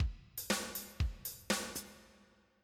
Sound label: ska